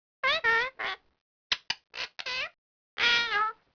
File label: Parrot Talk